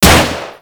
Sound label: Shot; Warframe; Weapons; Sicarus